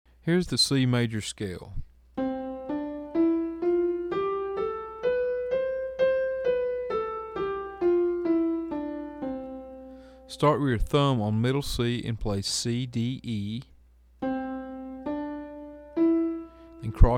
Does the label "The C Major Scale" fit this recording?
Yes